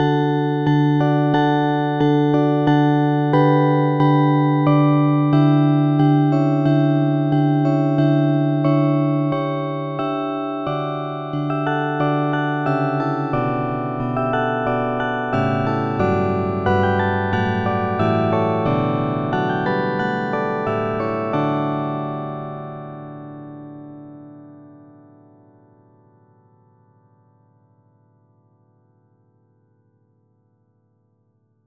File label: Harp, carillon